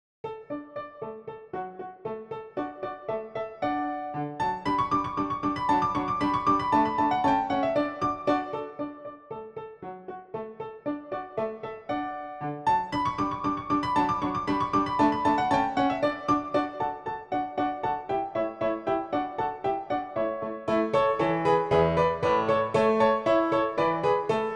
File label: Dance PianoTeq Bechstein; Dittersdorf 12 English Dances